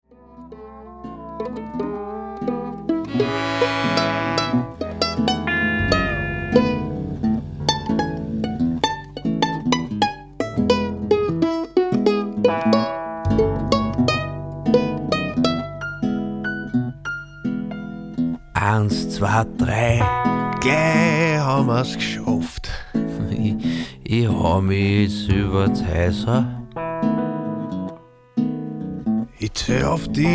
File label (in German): musik